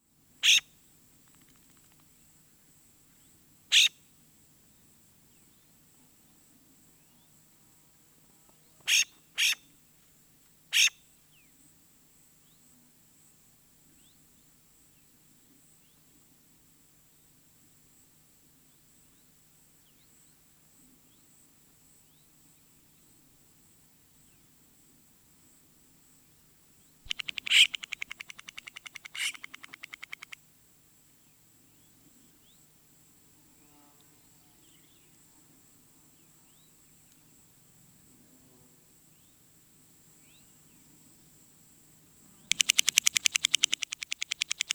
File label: Florida Scrub Jay Bill tapping and typical calls